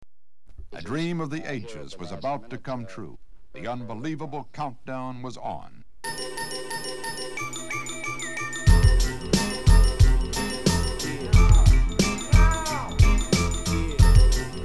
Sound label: hiphop